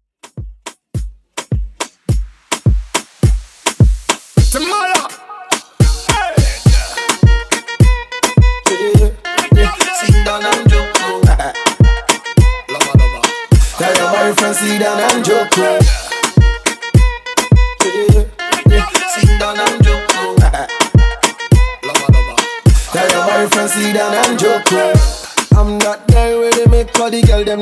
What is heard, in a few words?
Club Reggaeton Hook Intro 105 BPM